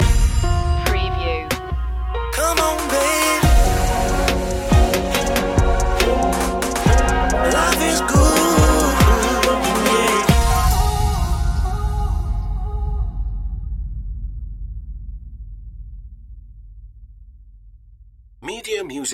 smooth soul vibes loop end

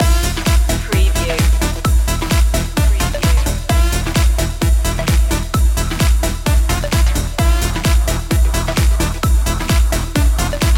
k pop fever loop

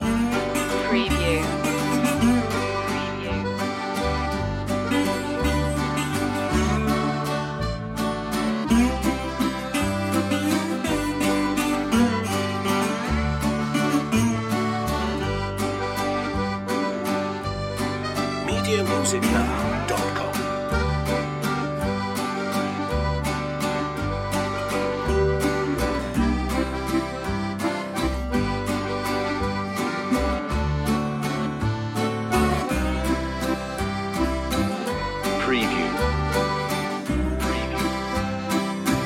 cajun bon ami loop

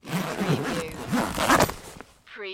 Duffle Bag Zipper; Sound Effects Bible

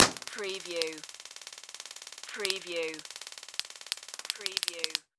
Stun Gun Fire Shot

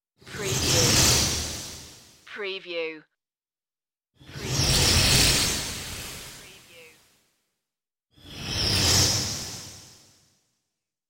zapping transform swooshes

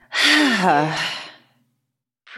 Female Tired Sigh

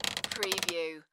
Wood Creak; sound effects bible

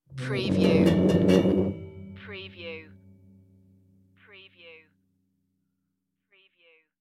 move metal furniture

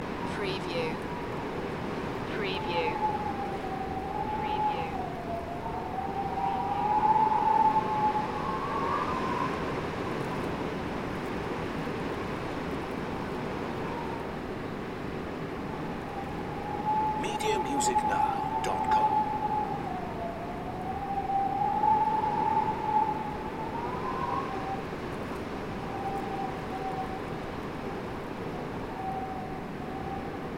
Strong Wind Ambience

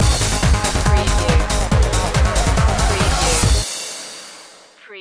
uberdrop

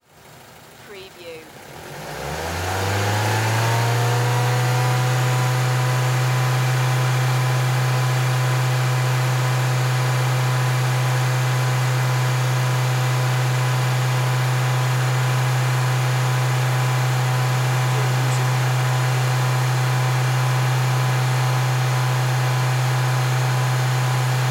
Car Mazda 3 High Rpm

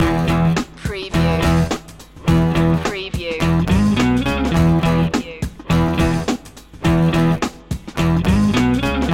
through moving road loop

